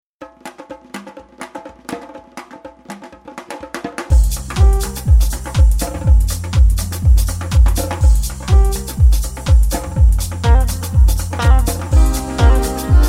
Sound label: club LOUNGE